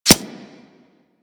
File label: Arrow Impact Default; Warframe; Weapons